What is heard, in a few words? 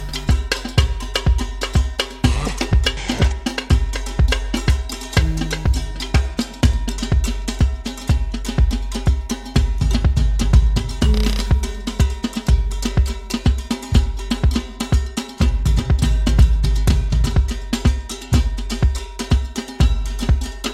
Drums